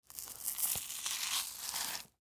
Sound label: Food Melon Peel Skin Rip Fruit; Household; Foley; Blastwave